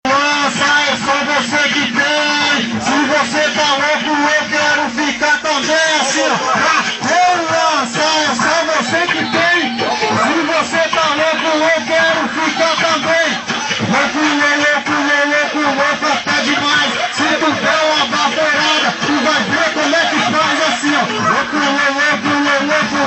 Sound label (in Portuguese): funk; funcao funk